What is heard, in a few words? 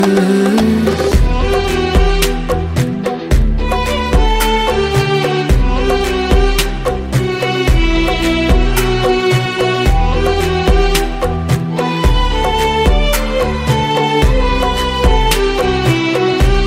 sweet music ringtone, bollywood ringtones